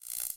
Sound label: tone elec; angband